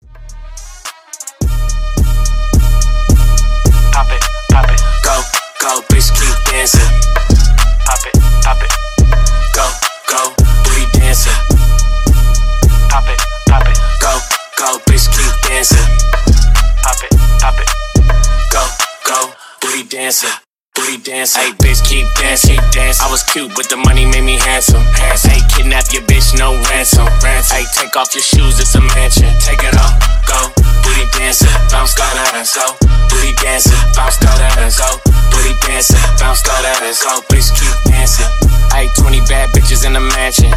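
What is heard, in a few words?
Acapella Instrumental Extended Breakz 107 BPM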